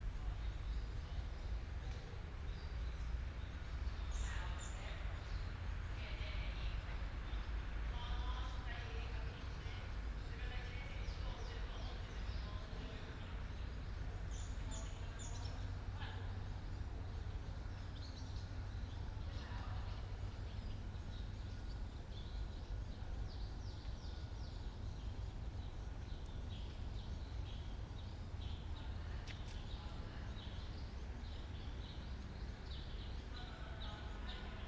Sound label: yamanakako sound